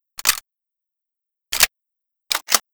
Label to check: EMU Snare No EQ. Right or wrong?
Wrong